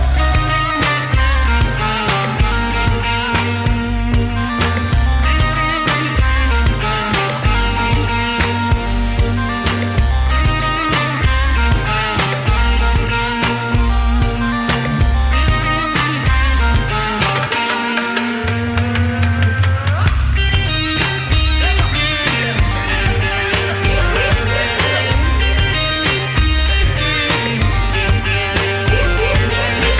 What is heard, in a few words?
Modern Arabian